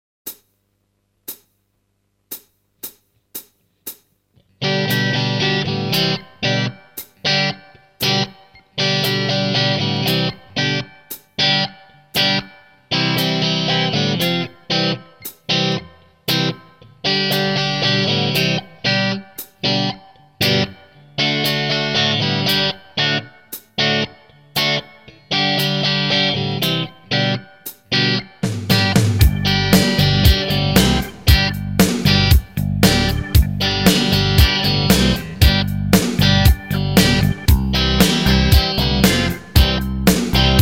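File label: Changing Chords, guitar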